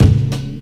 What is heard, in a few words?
subtle reverb bass drum one shot a key; kick